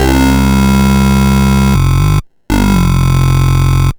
Sh09 Bass Loop, 120BPM

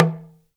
TLK DRM, Heart of Africa; Spectrasonics

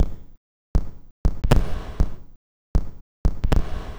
Glitchy, Beats

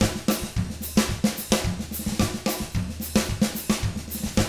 110 SAMBA, Drums; ILIO; Double Platinum Drums 1

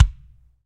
BD, Roland; Sound & Vision - Gigapack I

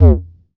606 Dist. Tom

